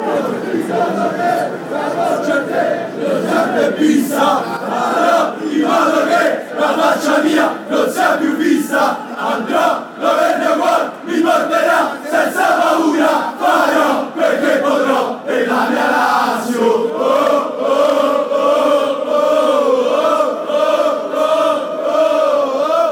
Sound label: oh oh oh, fanchants; lazio